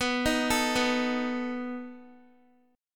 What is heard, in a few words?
B 7th B down Guitar; arpeggiated